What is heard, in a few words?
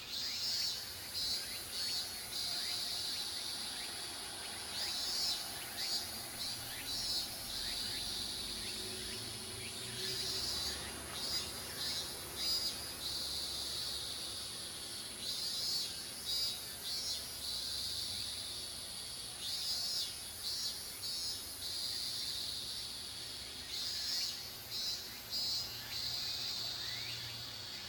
cicadas